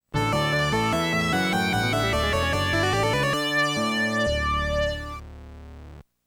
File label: Fanfare; Cadash; The 16bit Audiophile Project; Genesis